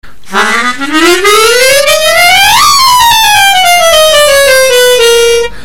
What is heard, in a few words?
Chromatica, harmonica